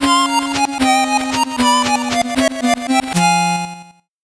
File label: 8bit jingle fast